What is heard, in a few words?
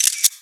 shutter; Sunset Horizons; seysant